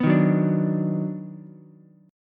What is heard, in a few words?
D sharp Minor Major 7th Sharp 5th D sharp down Ukulele; strummed